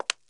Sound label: CONCRETE, FOOTSTEPS; 1000fx